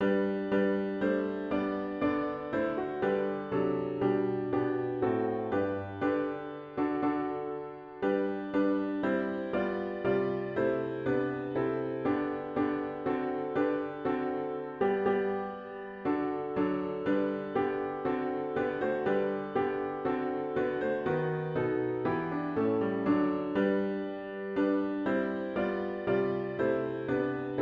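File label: piano only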